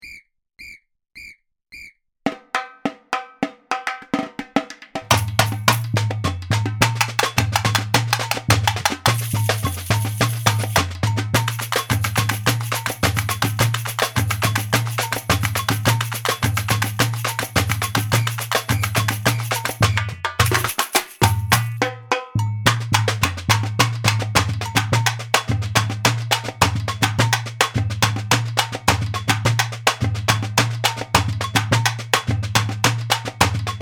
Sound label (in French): bases du samba